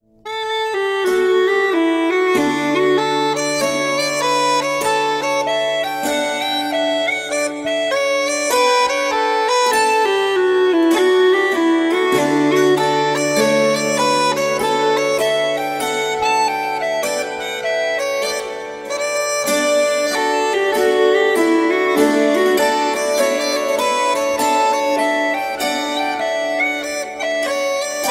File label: waterloo hornpipe slow